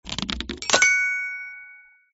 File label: add flower to garden; gardenbloom